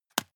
juggle catch medium

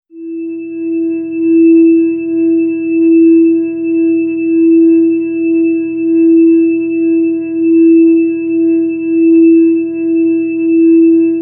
organ tone e

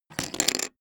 poker chip stack small fall down

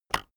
keyboard external enter release

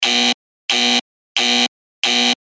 alarm seq

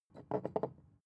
glass put down wobble soft

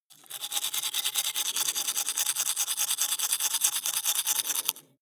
teeth brush dry open inward

